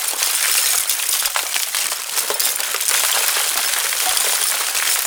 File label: Shipyards Metal Crusher Debris Loop; Ambience; Props; Warframe; Grineer Shipyards